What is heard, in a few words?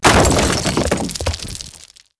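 break stone small; Spearhead Demo; MacFormat 2003 June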